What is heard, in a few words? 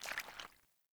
Boot Walk Water, Footsteps; Characters; Enemy; Melee Grunt; Boots; Sinecure